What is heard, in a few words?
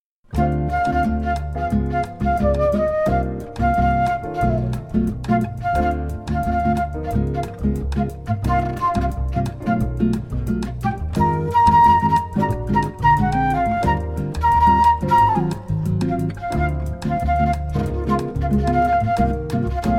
Jazz; Classical; David Turner Music Ensembles